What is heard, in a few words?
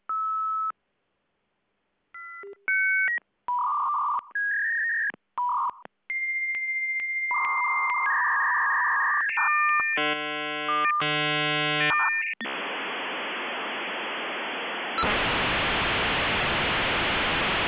xircom creditcard lan v90